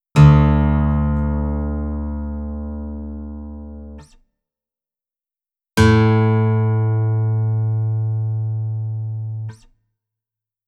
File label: D modal Tuning, guitar